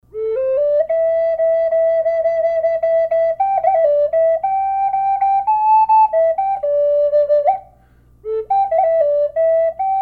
A flute; colibri hummingbird; collector flutes

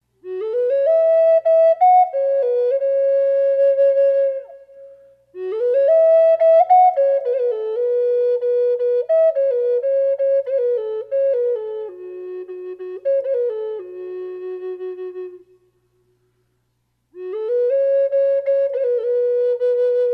white spirit wolf reverb, flutes

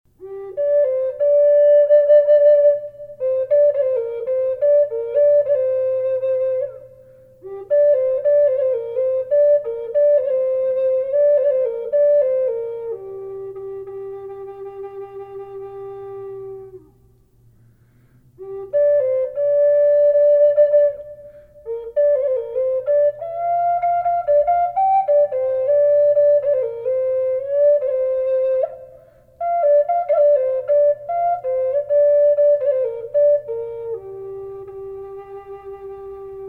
arctic fox reverb g minor, flutes; collector flutes

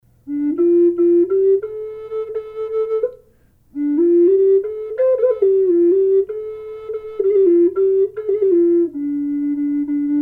flute; sequoia 2015 owl snowy; collector flutes

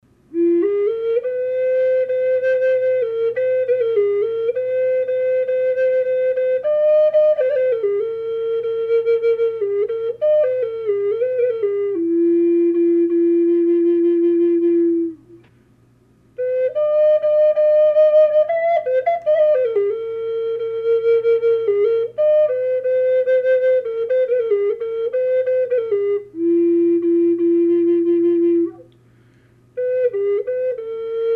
ambonya burl yellow cedar, flute; collector flutes

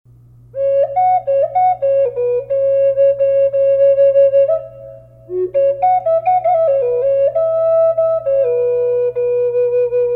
f sharp reverb, flute; bcat; collector flutes

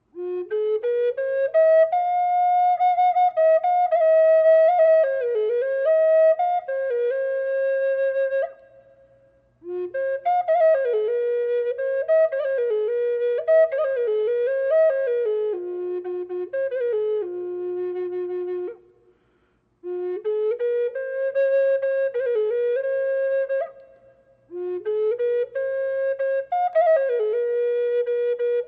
burlwood buffalo reverb, flutes

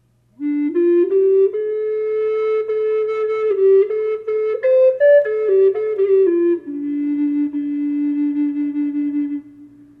low d, flutes; collector flutes

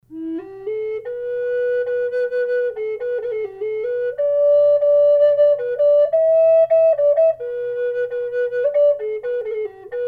Skunk flute; collector flutes

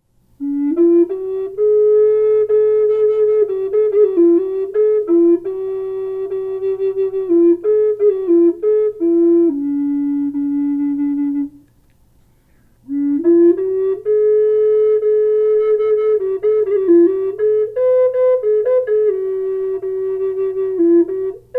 hummingbird low D flute; collector flutes

